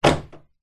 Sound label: vehicles car central locking in garage ext nissan patrol